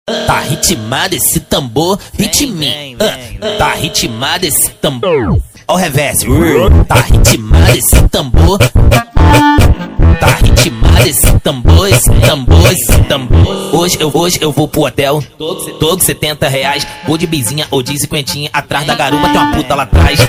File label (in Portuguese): Tá ritmado esse tambor Dancinha